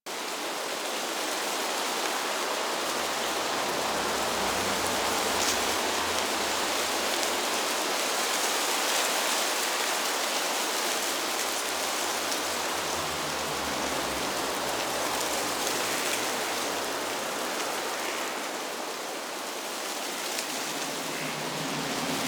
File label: Exterior Foreground Forest Loop; Ambience; Duviri; Warframe